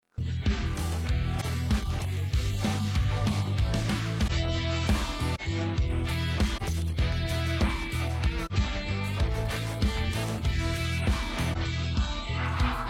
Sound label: no vocals